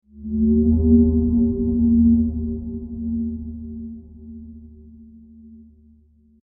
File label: Fog Horn Distant; Transportation; Boats; Vehicles